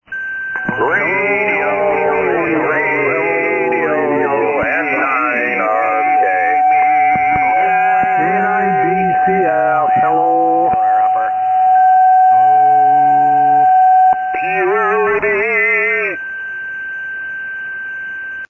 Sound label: SSB Net Tune Up